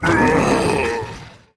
big die, voice

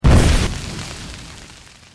spell death blow; archer

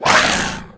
insect damage

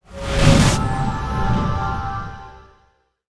spell archer quick step

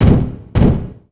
walk act foot, spider

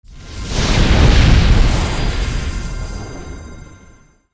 spell power breah; fighter

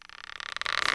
weapons bow ready; 12zi snake boss

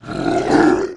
gorilla

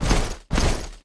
attack, fire knight

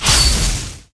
spell sudden blow; archer

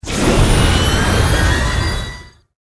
spell iron heart cast; fighter

